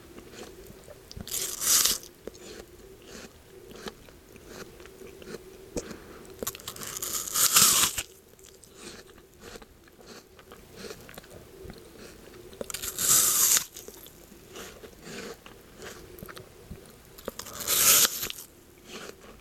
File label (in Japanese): 右耳 女性がリンゴを咀嚼する音ASMR; 快感ASMR～両耳でリンゴを咀嚼する音(素人女性フェチ音)